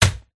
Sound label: cat block